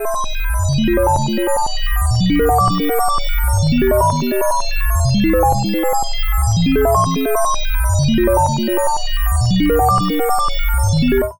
odd, Weird Loops, Chillout; Best Service ProSamples vol.36 - Chillout

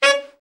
BRA ALT, Alto Sax

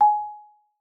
MAR G#5, MARIMBA; Xsample VOL-4

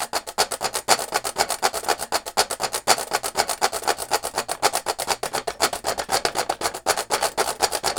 Washboard, Appalachian; Sonic Foundry (Sony Creative Software); Continental Drift Aftershocks

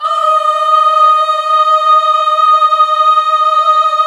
GOSP. AAAHH; Sound & Vision - Gigapack II CD 1 (Roland); HAL Gosp. Aaah 1

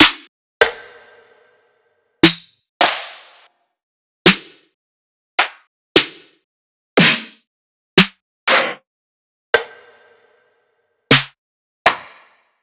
One Shots Snare

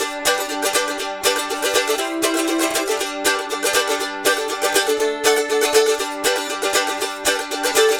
Mandolin Rhythm; Appalachian; Continental Drift Aftershocks

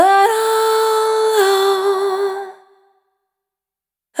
Vapor, Single Voice Melodic Loops; Pocket Diva World Class Vocal Samples

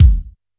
C2 - BD Gate, Yamaha PSR-E323 Electronic Kit

